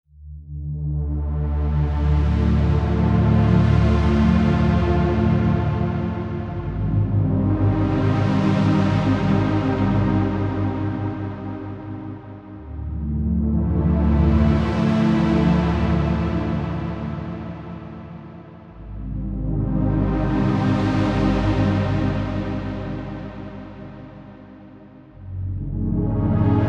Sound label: Pitch Crowd 75 bpm; soundtracks